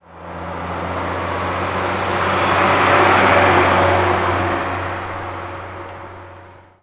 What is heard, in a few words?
truck by; SpecOps2 MPlayer Demo